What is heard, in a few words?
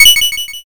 notify